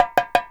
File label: DARABKA, Mediteranean Traditions, PERCS